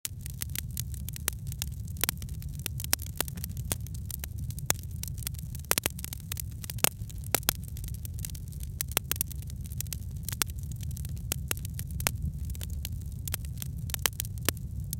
light burning campfire

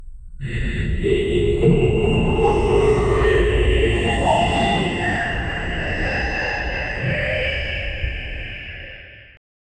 potence power dark energy

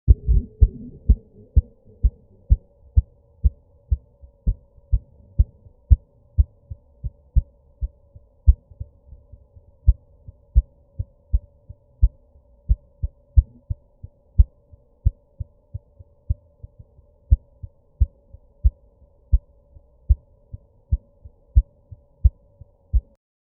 realistic audio of slow e; anxiety

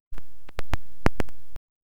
simple sound between exercise

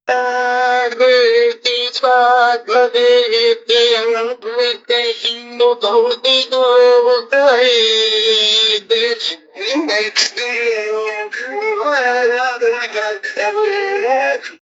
fun indian a little bit, ethnic

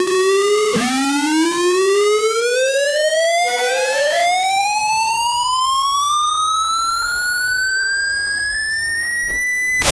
clown honk

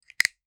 gentle grab sound, objects

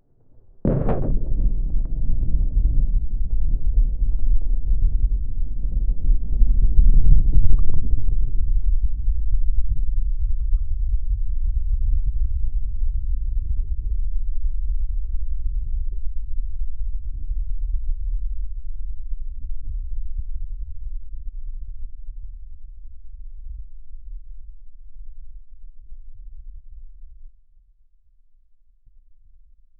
submarine moves and colli, collision

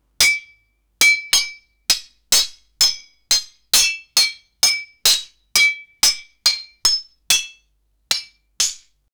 medieval massive melee, battle cries

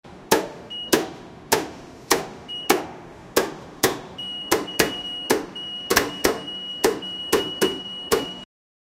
lego building sound

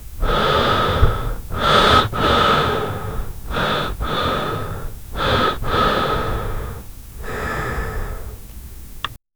quiet breathing

